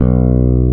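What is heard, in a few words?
Fretless, Bass; Best Service Gigapack 2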